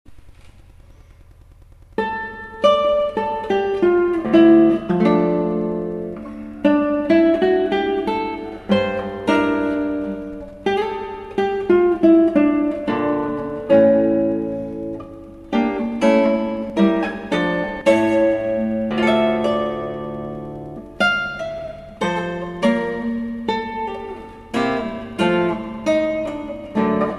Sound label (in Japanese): エンデチャ, ギター